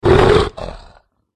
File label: white bear wound